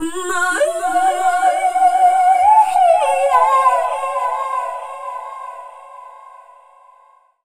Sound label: Dance Vocals, FEMALE